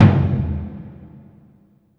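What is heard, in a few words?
Burundi drum, Heart of Africa; Spectrasonics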